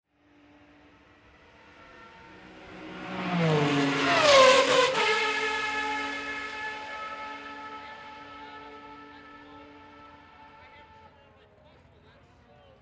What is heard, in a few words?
formula hi talk ring out, Formula Race Car, Very Fast; Formula Race Car By , Fast , High Pitch , Some Talking Over Ringout; Cars; Vehicles